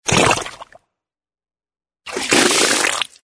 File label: comedy messy squirt